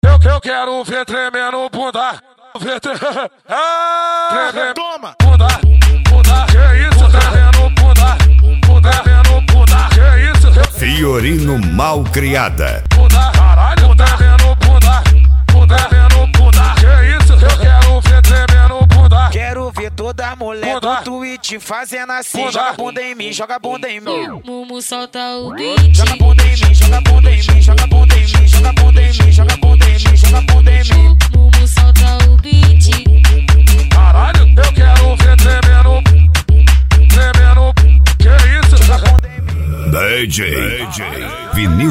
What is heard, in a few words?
mega funk bass